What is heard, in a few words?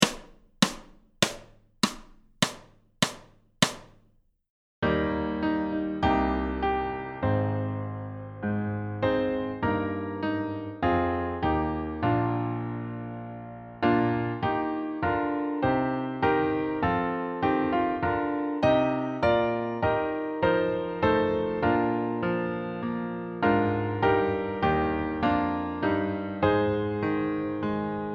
Snare, accomp